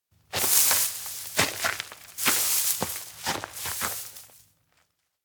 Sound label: steps outdoor bush walk; ken; anemoi